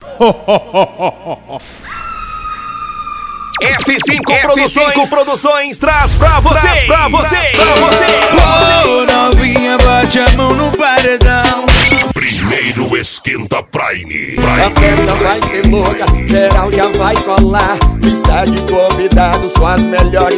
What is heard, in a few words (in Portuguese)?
vinheta; esqueta prime em ibirataia